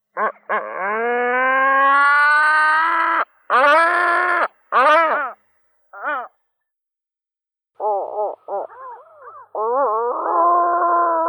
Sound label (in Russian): hohotun chernogolovii larus ichthyaetus